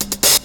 HIHAT SHT; BEAT2000

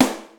ROCK SN, DRUM; BEAT2000

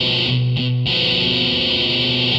GUITAR, ROCK POP; MAGIX

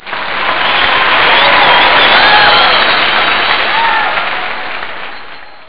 applause

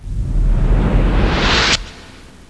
HOLO OFF; PLAYER

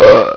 BURP

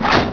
SWOOSH

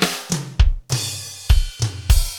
FILLS, ROCK POP DRUM; MAGIX

